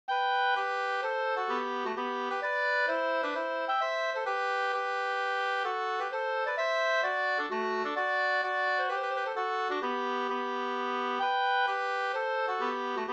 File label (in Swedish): hambopolska